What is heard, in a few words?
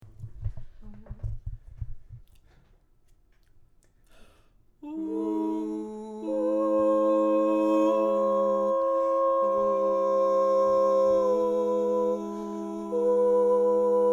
Magic spiral basic